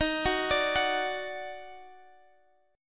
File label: D sharp Minor Major 7th